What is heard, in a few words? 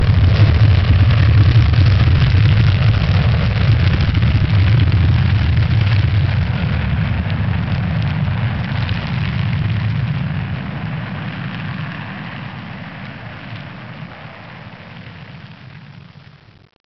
explosion atomic bomb